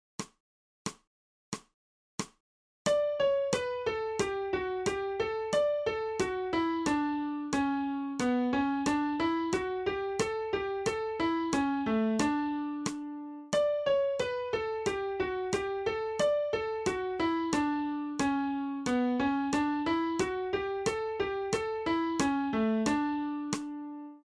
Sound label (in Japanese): 新フレットレス